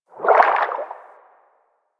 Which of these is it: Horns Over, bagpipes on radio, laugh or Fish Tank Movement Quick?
Fish Tank Movement Quick